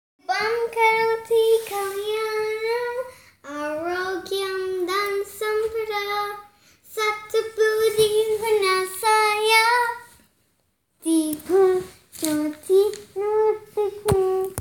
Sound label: Shubham Karoti Kalyanam Mantra Sung